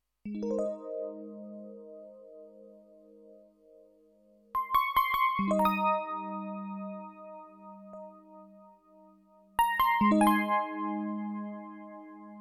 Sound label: Epiano